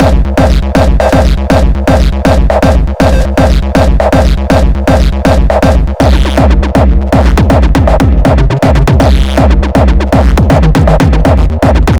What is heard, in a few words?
Beats Loop; Anger Foot